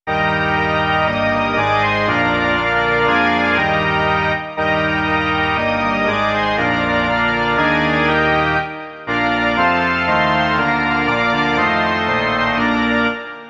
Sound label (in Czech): varhany; Onen hněvu den se blíží